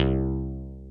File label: HARP C1; Yamaha VP-1; Music Store; HARPOPHON